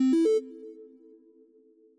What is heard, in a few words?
win